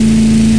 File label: CAR; NFS3 Cars; hrod